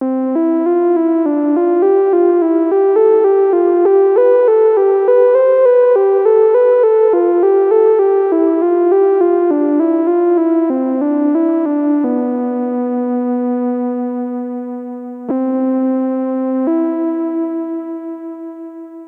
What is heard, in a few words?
Sequential; Sequential Take5